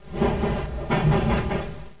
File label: Point, Train; OpenBVE